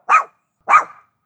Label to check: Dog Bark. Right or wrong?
Right